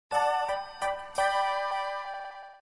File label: chime; magnum